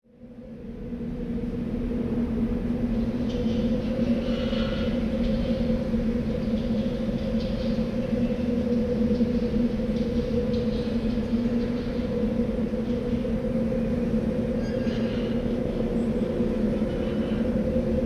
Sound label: Fallout City Ambience